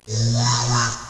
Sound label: Power Up; evilcore